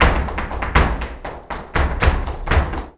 BULERIAS TAP, Flamenco

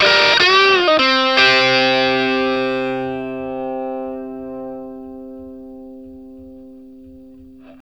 Vintage Blues Guitar, 60, C; USB Soundscan vol.22; AKAI

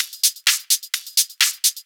Hihat Loop 128 BPM

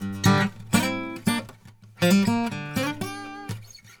Blues Guitar; ILIO; Fretworks; LICK

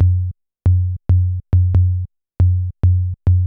SINE BASS, Techno 138 BPM; Best Service ProSamples vol.54; AKAI; DAVE CEE

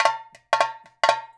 fill, percussion

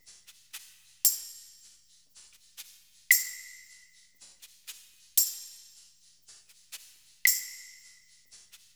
percussion loop

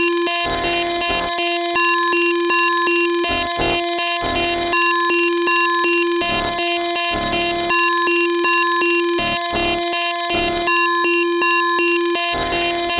NOISE, Synth FX Misc; E-MU Producer Series Vol. 8 – Platinum Phatt

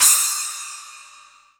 Splash, EMU Orbit-3 drum machine; 300 Drum Machines

